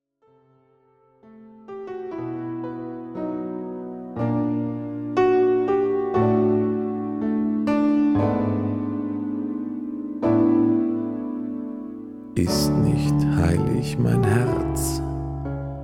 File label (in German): Menschenbeifall